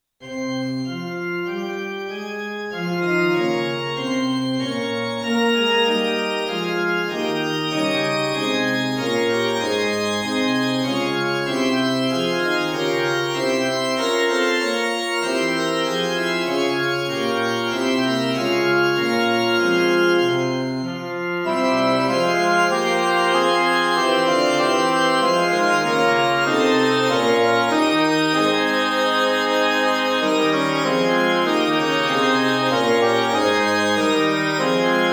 Fl Ob Cl Fg Organ, Tonality：f (♭♭♭♭) Tempo：Quarter note = 96